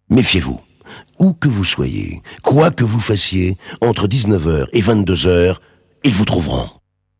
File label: jingle; dorval; supernana